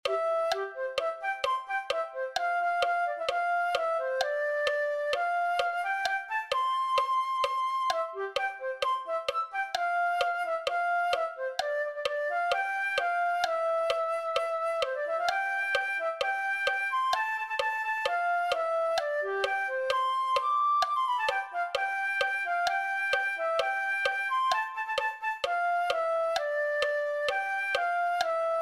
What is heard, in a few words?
flute